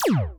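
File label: enemy shot simple; AssaultCube; Alien Stars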